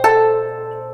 Bass, Promotional Loops; Sonic Foundry (Sony Creative Software); Not Just Another Pretty Bass

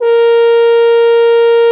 bucket A#4; Xsample VOL-3

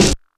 kit, Yamaha Motif-ES8

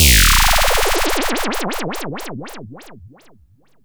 SYNTH FX

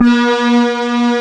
ELEGANT PAD

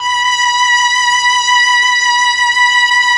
STR, STRING; STRING1+STR3

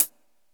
HAT

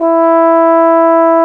E4, BRASS; AKAI S-Series CD-ROM Sound Library VOL-8

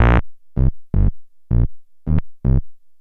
Bass, Futurist Drum & Bass

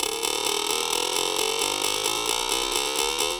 SPRING LOP, Anime Sound FX; COMIC

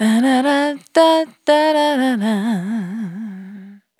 Dry, Single Voice Melodic Loops; Abdussa; Singles

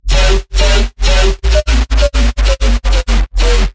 Bass House Bass Phrase

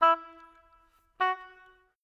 Oboe, Orchestral; Sonic Foundry (Sony Creative Software); Orchestral VOL-2; Modern 02

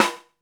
SIDEKICK, SNARES; Back In Time Records; Big Bang

